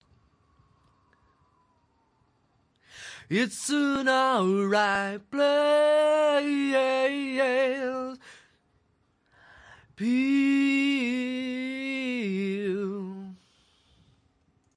male vocal, 180 bpm male acapella

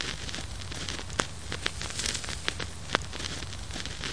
end of record crackle; Electro and Synthetic

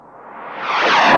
Electro FX; Ueb HE Electro FX

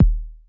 kick, drums single hits; iElectribe Kicks

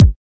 kick

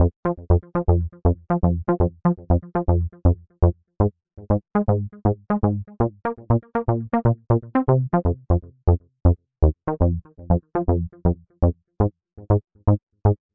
120 Bass, 120BPM; Breathing Circle

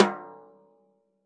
natural sd rim off, snare

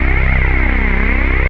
hoover; Pads

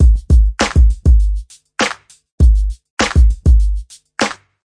100 bpm nu groove, drum loops and breaks; 091 to 100 bpm

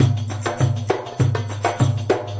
mideast drum and tambourine, 100 bpm; 091 to 100 bpm

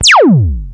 mongozap, Electronic Hits

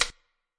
machine, Machine Electro Hits; Hip Hop; STYLE KITS (Hip Hop, Etc)

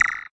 cyborg Cricket; Cyborg Kit

